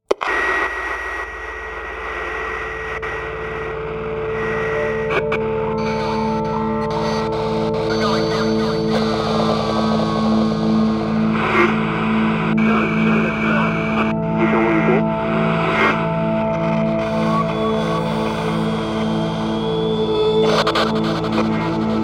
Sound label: squelch